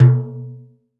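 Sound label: open hand, timbales; E-MU Producer Series Vol. 2 – More Studio Essentials; Composer; Timbales Xswitch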